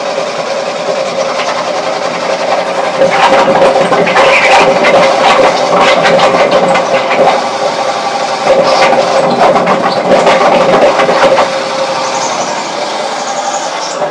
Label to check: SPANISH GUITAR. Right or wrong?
Wrong